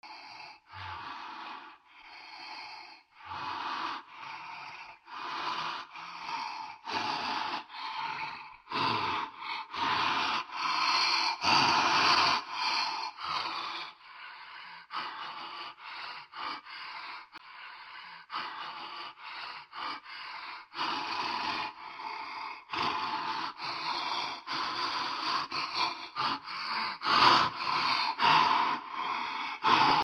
dark heavy breathing

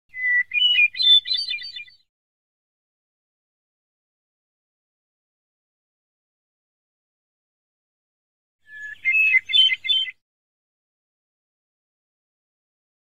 nature hermit thrush

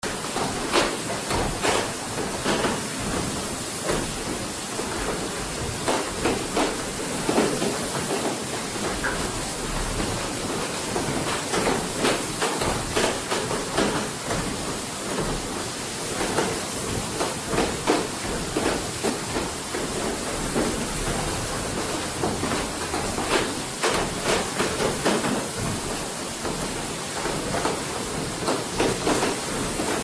atmo rustic watermill

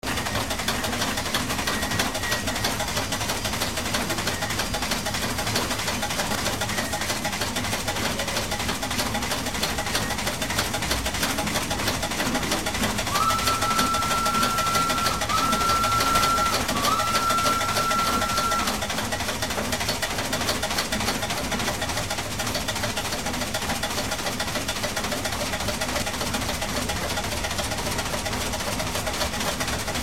atmo steampunk crane operations

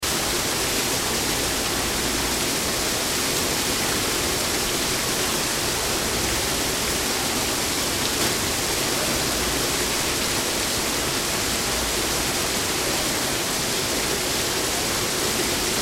atmo germany berlin breitscheidplatz fountain

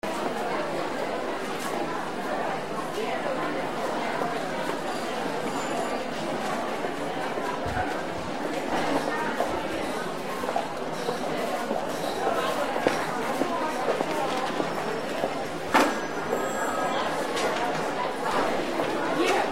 atmo airport ticket counters

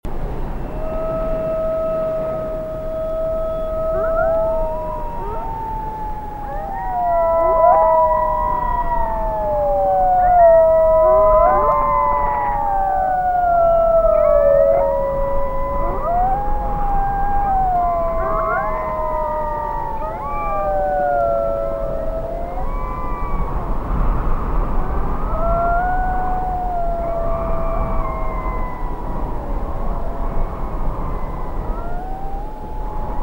Atmo Night Wolves and Howling Wind

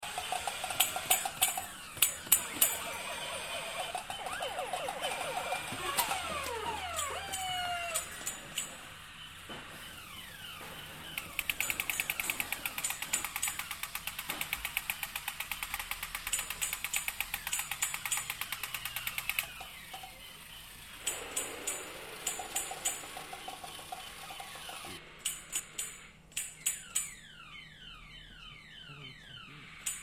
atmo holidays toy store gizmos

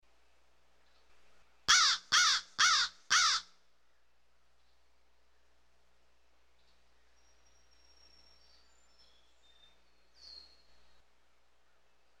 atmo birds crow

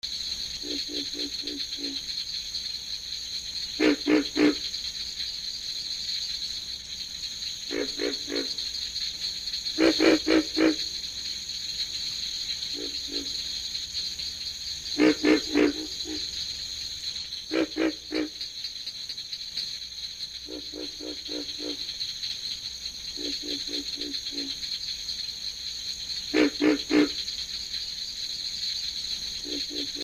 frogs pig frog

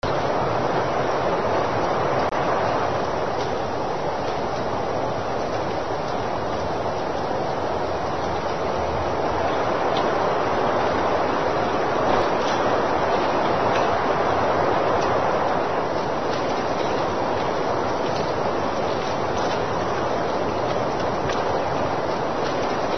atmo holidays winter winds

